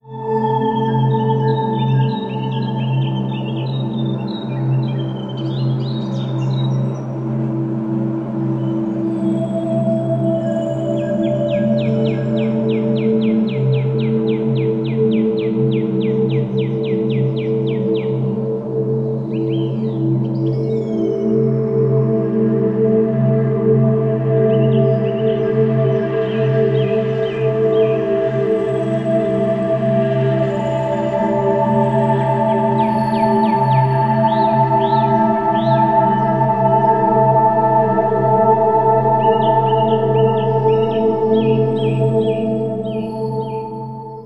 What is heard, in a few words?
Deep Meditation